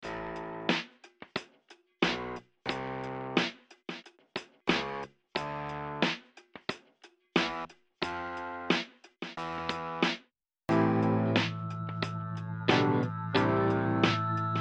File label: disco II